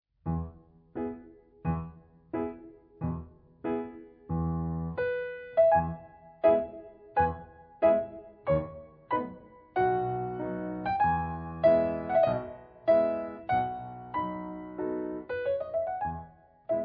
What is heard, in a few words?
Battements tendus Variations; Battements tendus No1; Rossini Chopin